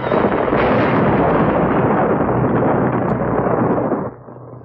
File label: explosion; Crash Bang Boom